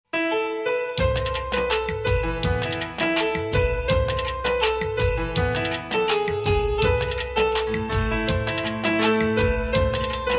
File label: ringtone instrumental hindi ringtone